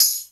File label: HR16B TAMB